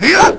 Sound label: "Yah"